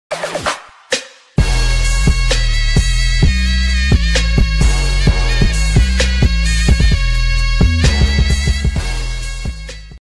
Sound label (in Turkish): zil sesi ozel harekat; soz